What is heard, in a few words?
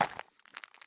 run glass; Escape from Tarkov